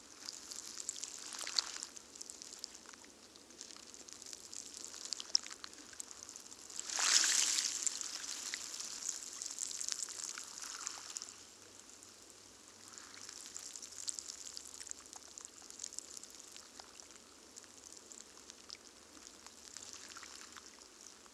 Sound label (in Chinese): 水花慢; 海浪聲; 自然音療